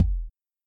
Cajon bass

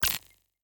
Ui Click Menu Modern Interface Select Medium

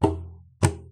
Empty Bottle One Shots

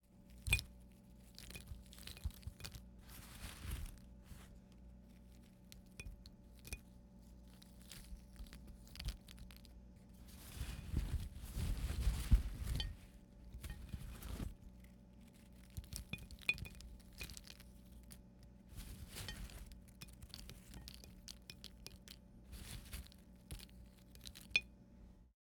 Dropping Sticks Plate

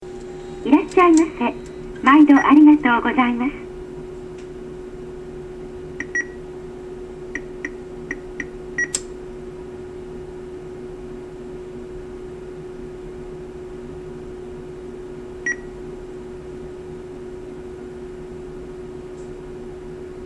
Bank atm

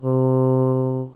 Oooh

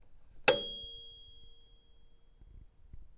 Microwave Dial Ding

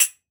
Finger Cymbals Mute

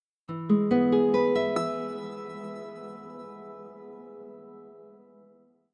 Guitar Transition

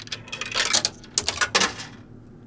Quarter Slot

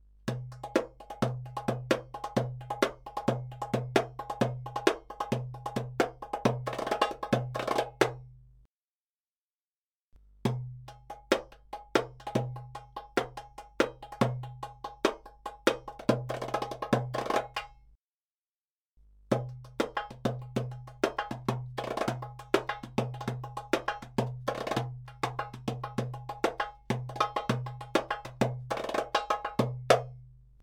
Aksak Darbuka

